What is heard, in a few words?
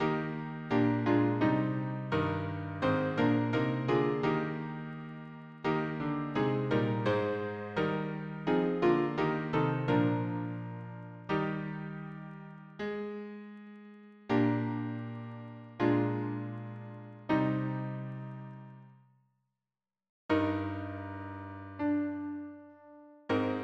harmony piano